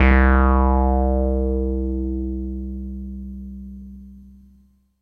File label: ARP Pro Soloist